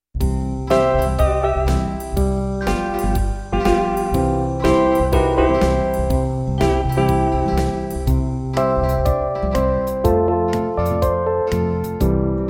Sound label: aloha